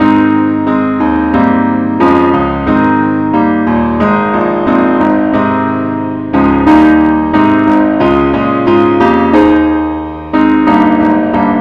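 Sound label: keyboard only, key A flat; christe Sanctorum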